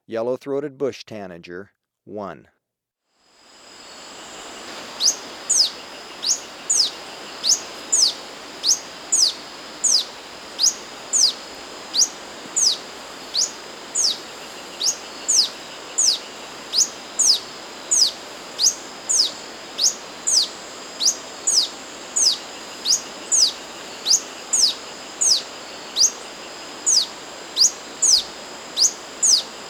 Yellow-Throated Bush-Tanager, Birds of Ecuador; S. America

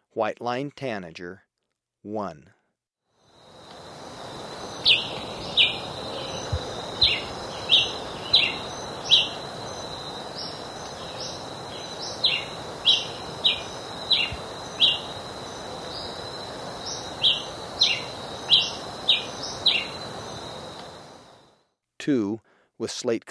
White-Lined Tanager, Birds of Ecuador; S. America

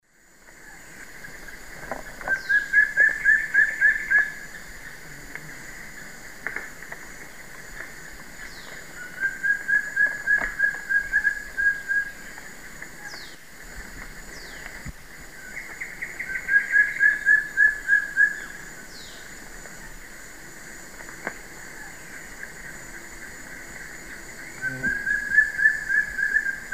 Piprites chloris, song; S. America; Eastern Lowlands

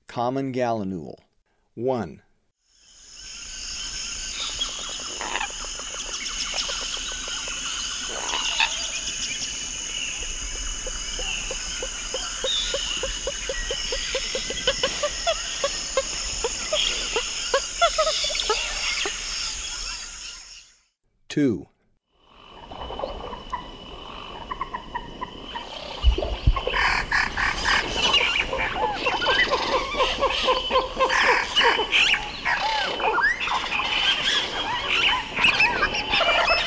Common Gallinule; S. America

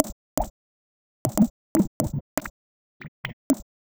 Mecha Samba, Glitch; Ambient Cinematic; Glitch-o-Matic

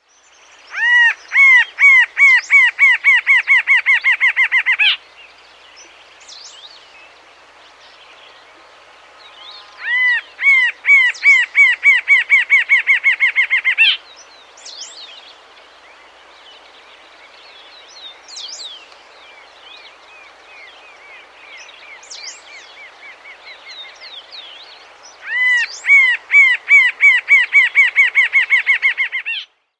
Rufous-Winged Antshrike; S. America

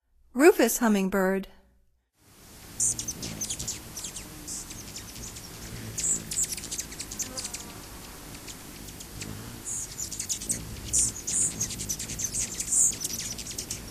Rufous Hummingbird; North America; Stokes

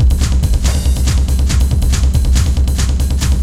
techno 140

